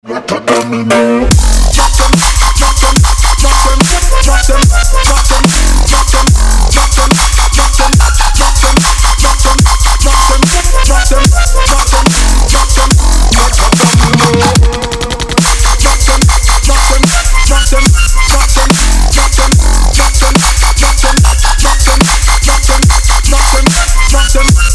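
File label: hard dubstep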